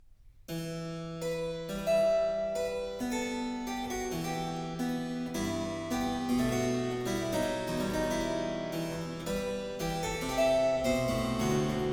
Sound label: Sonata No. 5 in F Minor, Largo, Violin and Harpsichord; BWV 1018; Bach; J.S. Bach